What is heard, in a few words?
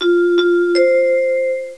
dogs